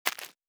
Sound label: UI Simple, Interface; Survival Game Sounds - Lite Edition